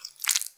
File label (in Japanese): 膣内射精前, 引き抜かれた瞬間; 挿入or引き抜く